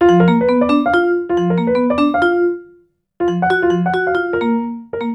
Piano Vibes Combo; Sonic Foundry; Cinematic Funk Urban Tone Poems; Master Zorn

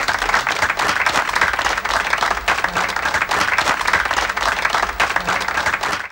APPL, XP APPLAUSE; Best Service - XXL Production Effects

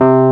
WURLITZER; E-MU Formula 4000 Series Vol. 3; Analog Odyssey

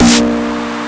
SUPER SNARE; Spectrasonic Distorted Reality 1; PHUZZ DRUMS

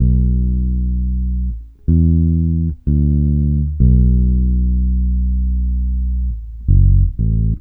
FEAR B, Bass Grooves; USB Soundscan vol.30; AKAI

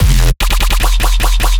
bass loop squishy laser 150 E; Virtual Riot

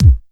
BD, Casio CTK-5000

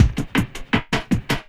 LOOP, 160 BPM, Drum & Bass

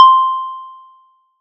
SY PLASMO; ModelCycles

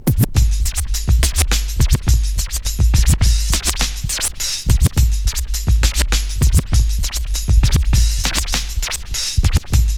SCRATCH STUTTER LOOPS; Megabass Remix! 2

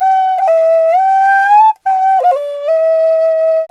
didgeridoo riffs

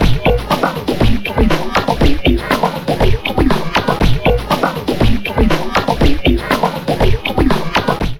Drum Loops Crazy Processed, 120; USB Soundscan vol.07; AKAI

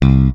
PLECTRON FX C2; Xsample VOL-1

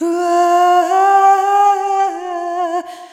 Arrhythmic F

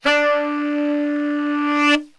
D 2 SAX; Best Service; Brass Super Section

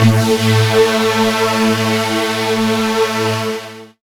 saw lead synth

